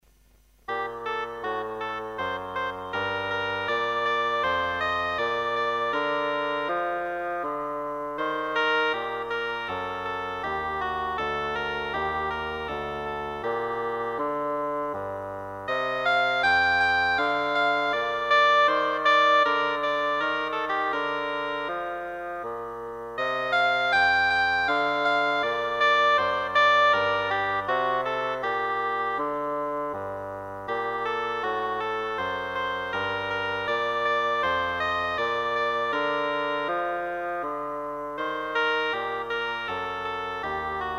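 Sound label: cantiga, oboe bassoon; stereo; cantiga 145 de alfonso x el sabio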